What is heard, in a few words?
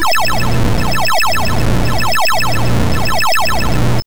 Landing; ET